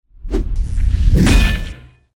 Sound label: attack; hero